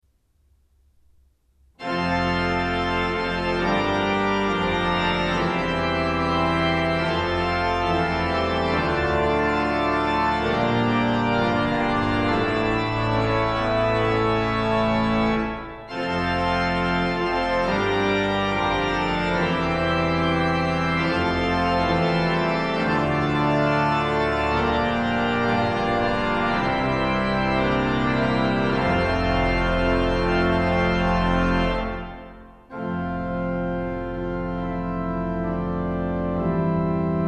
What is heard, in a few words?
Fanfare